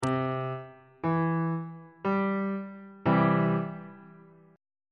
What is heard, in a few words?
d double flat major chord on bass clef